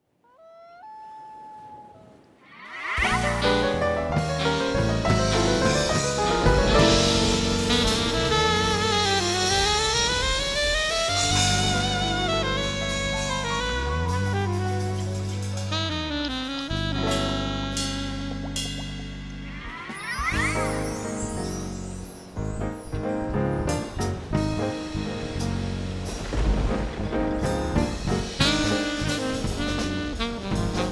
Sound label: Waltz, piano